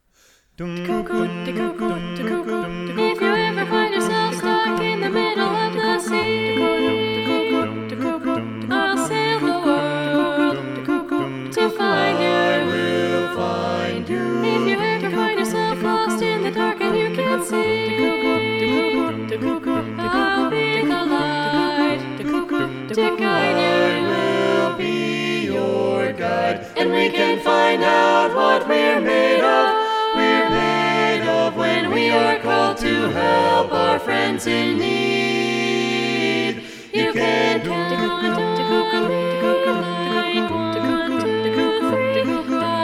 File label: SATB Balanced Voices